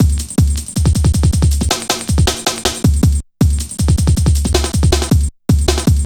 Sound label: Drum Loops, 158.5; Jungle